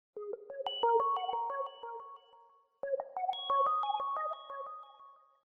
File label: notification ringtone, sms ringtones